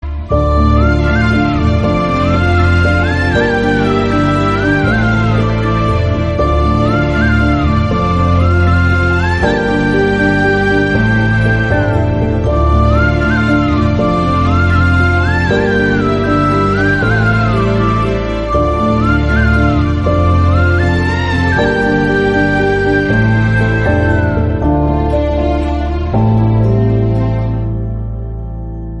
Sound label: BGM Instrumental; Thiruchitrambalam